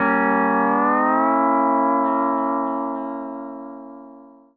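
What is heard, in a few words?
pedal steel chord; PEDSTL CHORD; Country; G DIM7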